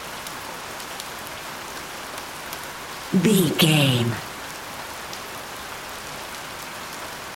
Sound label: rain medium loop